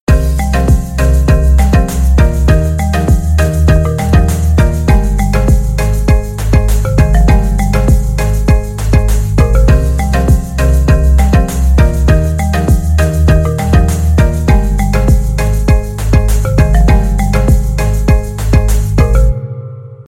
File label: marimba